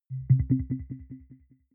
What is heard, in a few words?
button echo beep resonance arpeggio